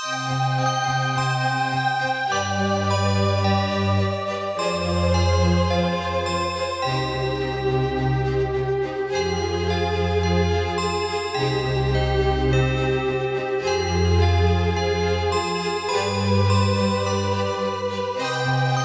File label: Classy Ghouls Halloween Gathering, funny